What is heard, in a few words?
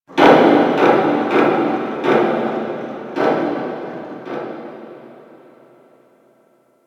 Infested Distant Metal Creak, Ambience; Warframe; Corpus Infested